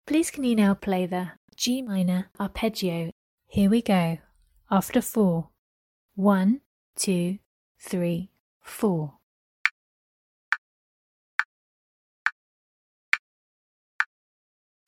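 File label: G minor arpeggio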